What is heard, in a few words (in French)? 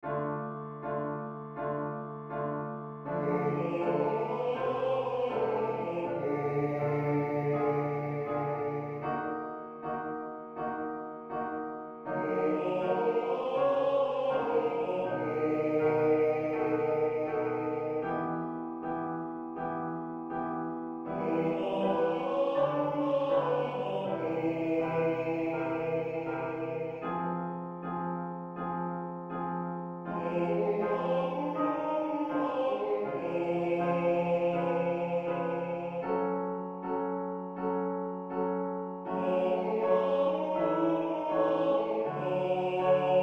mise en voix echauffement vocal exercice vocal vocalises